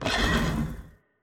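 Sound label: fallen steed damage, monster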